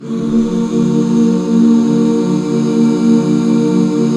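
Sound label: Eb minor 7, back chords; Sound & Vision - Gigapack II CD 1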